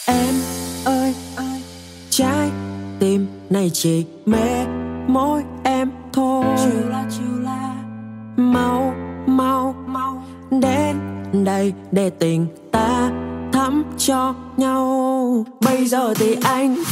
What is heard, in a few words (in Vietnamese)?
Nhac Chuong Remix